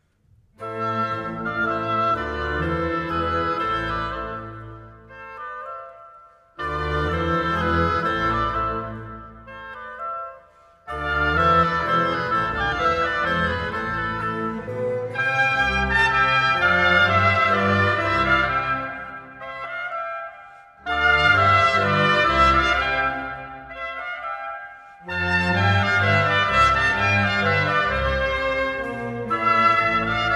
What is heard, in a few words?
Trumpet Concerto No. 2 in C major; ARUBINONI; Legend of Galactic Heroes; Galactic Empire SIDE